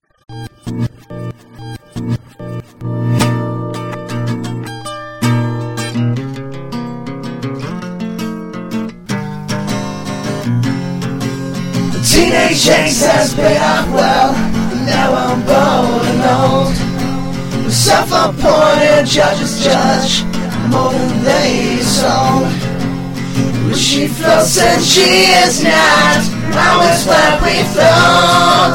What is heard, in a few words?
acoustic